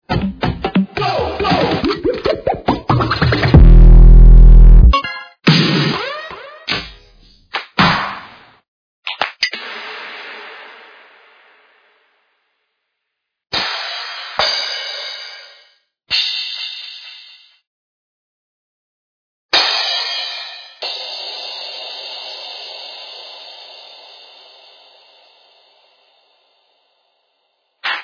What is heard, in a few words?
trap and future bass